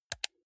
console controller playstation press fast hard

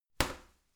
garbage container light lid shut hard

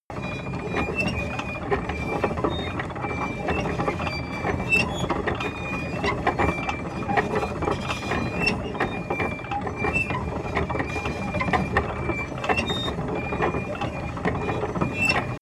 tank engine unsteady

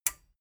metal button stereo release enable

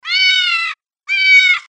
cat miau

